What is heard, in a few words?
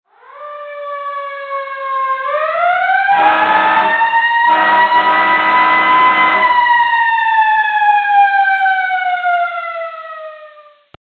siren